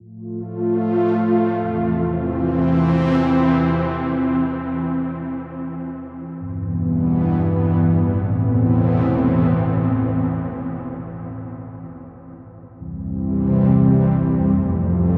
75 BPM A Min Synth, Ambient; Melody Loops; Awakening; Cymatics; ANALOG EVOLUTION Beta; Ambient Collection

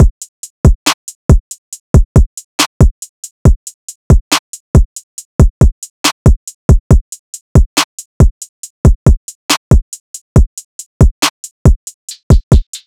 Drum Loop - 139 BPM, Hip Hop; Drum Loops; Monaco; Cymatics

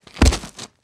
Heavy Grit Concrete Body Fall; Fairlight Sound Lib

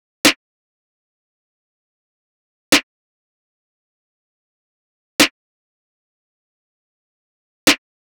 Drum Loop - 97 BPM Snare; Loop Stems; Cymatics; Vibes II Drum Loop Collection; Waves

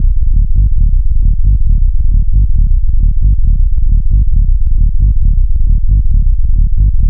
Bass Loop B 135, Techno; Ghosthack; AC2024 - Day 1 - Techno Sounds 2025

